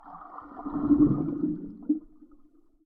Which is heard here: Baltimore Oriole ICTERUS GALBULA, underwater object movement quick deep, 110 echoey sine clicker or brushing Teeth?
underwater object movement quick deep